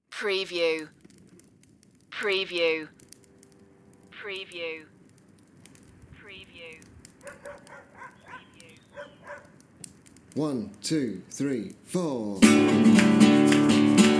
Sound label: intro vocal